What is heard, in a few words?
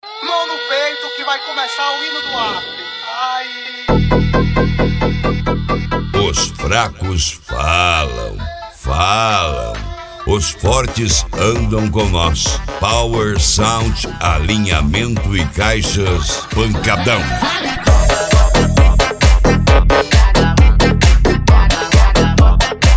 pancadao; Power Sound Alinhamentos e Caixas